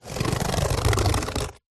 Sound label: foley cardboard scratch fingernail long